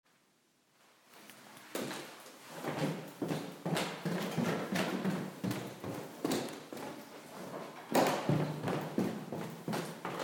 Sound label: sound of an 100 years old oak staircase